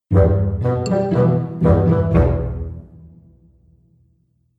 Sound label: Cartoon Fun Musical Element